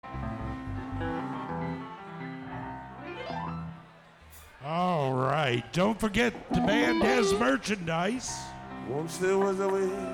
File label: Tuning and Introduction